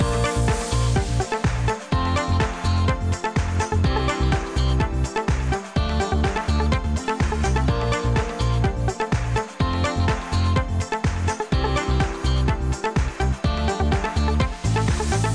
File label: BGM Free Spin, SLOTS; Ancient Scrolls